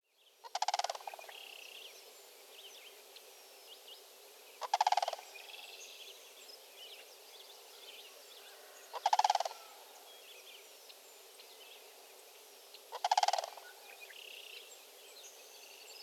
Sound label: Sandhill Crane pair in field calls